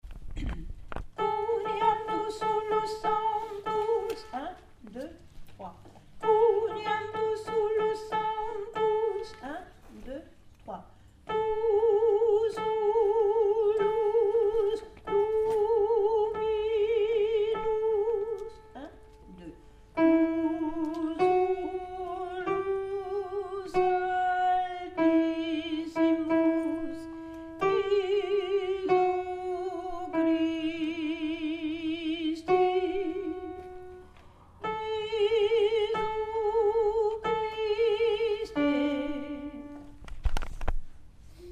quoniam alto; gloria de vivaldi

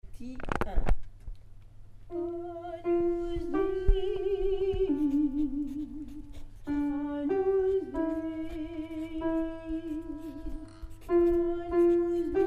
pie jesu alto

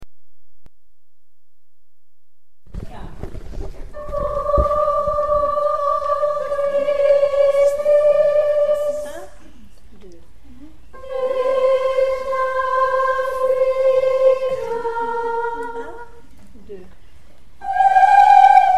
stabat soprani o quam tristis; Stabat Mater - Giovanni Battista Pergolesi; 3 Stabat o quam tristis sopran

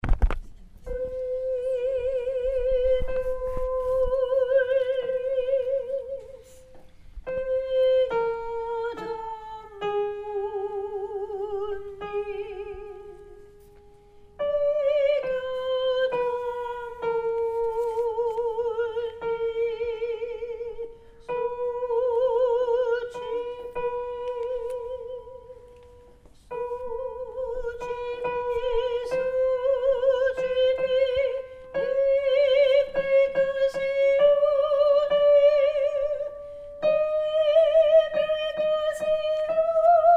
qui tollis sop; gloria de vivaldi

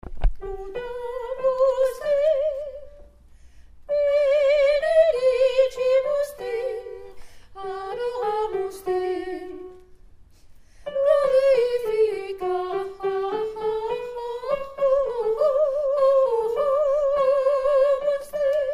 laudate alto; Laudamus te - Extrait du Gloria de Vivaldi

